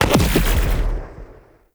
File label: Rocket Explosion; Elite Jelly Rocket; Warframe; DE; Vehicles; RailJack; RailJackEnemyShips; Grineer; Space Fighter Dredger Agent